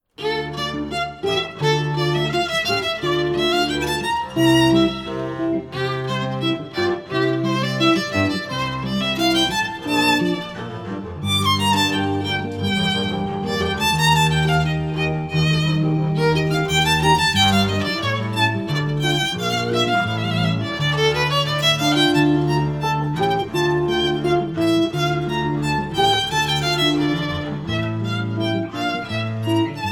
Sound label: String Trio